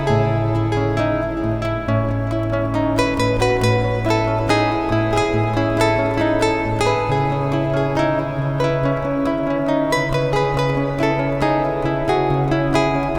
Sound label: loop; Ad Infinitum #003